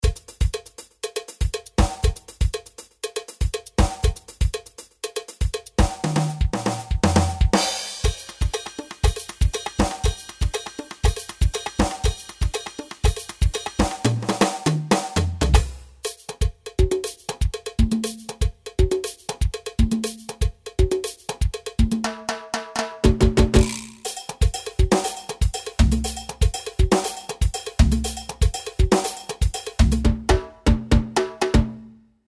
Songo

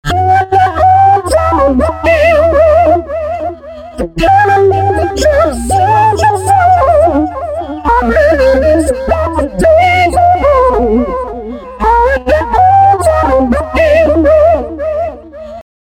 Vocal Articulator

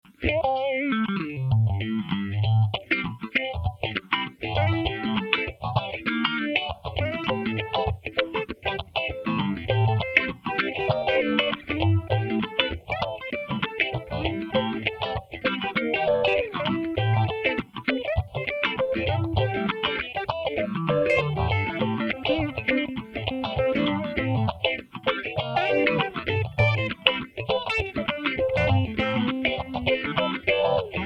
Phase Groove Fat

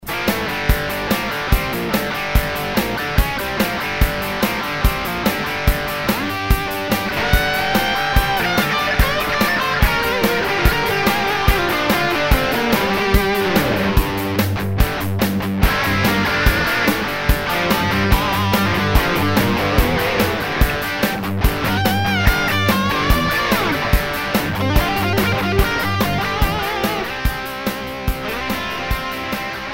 Soldano

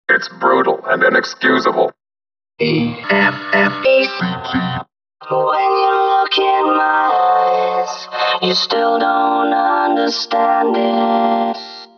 DC Vocoder; AKA VST DC

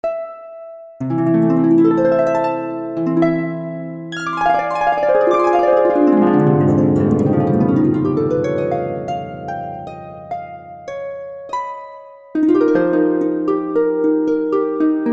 harp

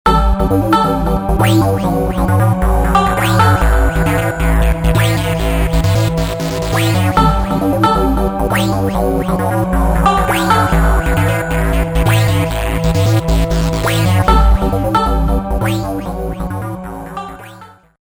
UK House

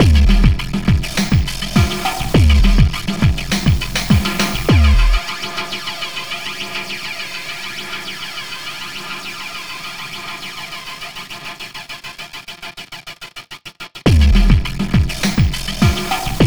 dance pack skylab beat

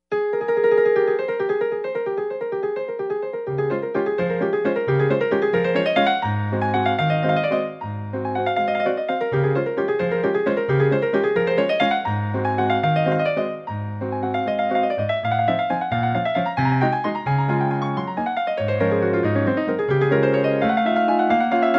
Valse

